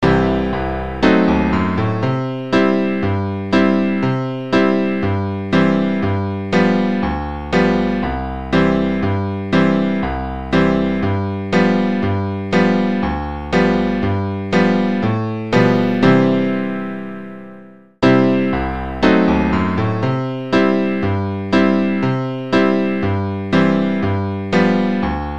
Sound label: without us two accompaniment only